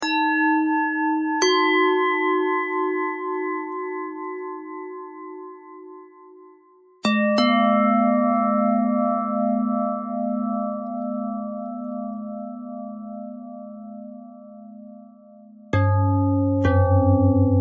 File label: bell bend threads